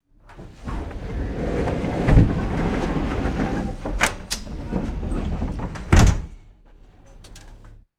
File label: train carriage window sliding shut; glass